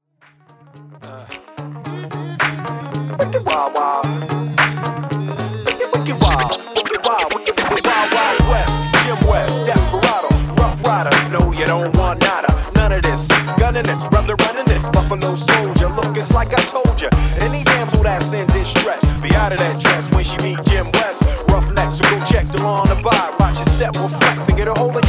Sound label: Blend, 110 Bpm